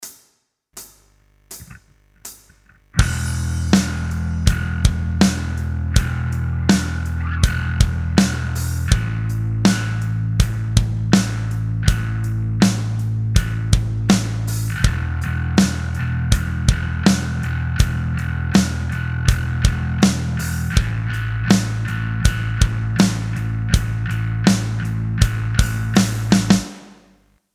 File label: Backing Track; beginner guitar mastery